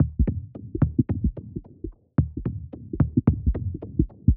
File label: 110 Organic, Percussion; Loops; Percussion (Stereo); Touch Loops; ElementsOne; Abstract Rhythms